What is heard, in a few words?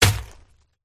dragon red attack contact middle